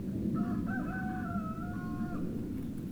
Cri de coq trèèès loin; Bruitages

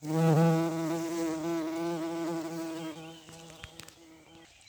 Bourdons beau massif; Vols Bourdn abeilles

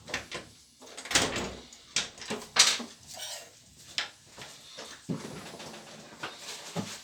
Porte bruits divers; Percu Bois

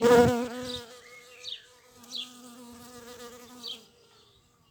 Abeille curieuse qui cherche; Vols Bourdn abeilles

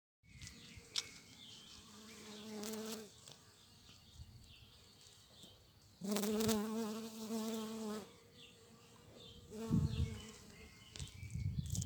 Abeilles du coin

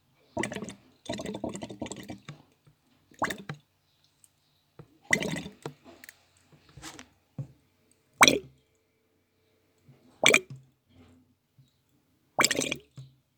bulles fermentation; Bruitages; Eaux

